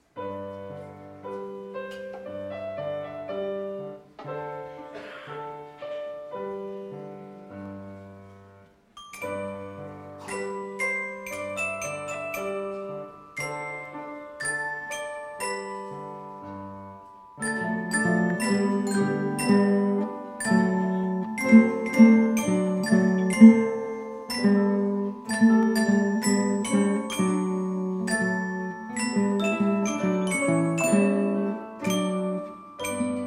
Kinderorchester

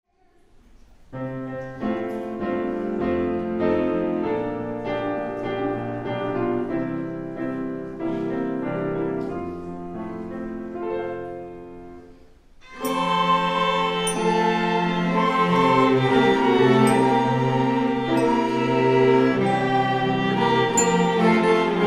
Vorspiel Kinderorchester; Freud, Freud

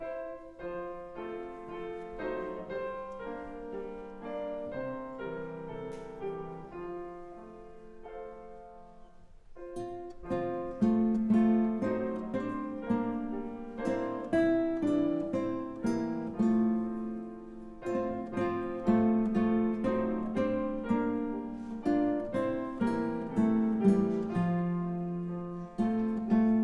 Kinderorchester; Ich weiss ein kleines Woertchen

